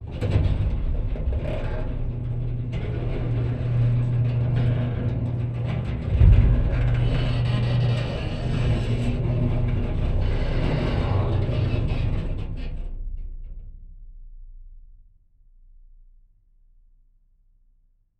garage door, operate, mechanical, open, door, panel, boomy, full, contact mic; Outward Inversion